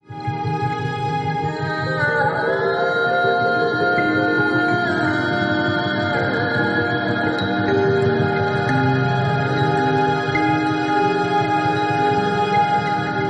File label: AMBIENT EXPERIMENTAL